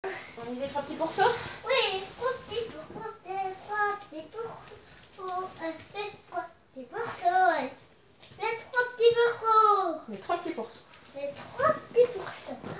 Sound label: Vocal